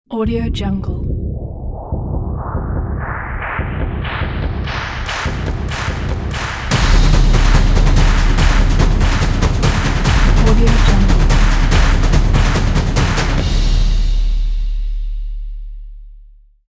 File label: logo stomps